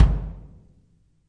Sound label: real kick; A Real Freakin Drum Kit